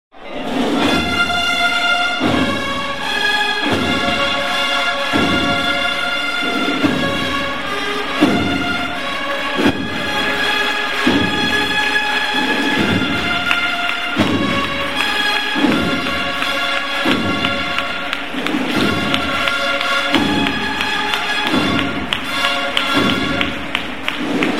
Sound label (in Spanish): Marcha Real; certamen